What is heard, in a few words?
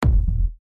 Kick; Liquid Night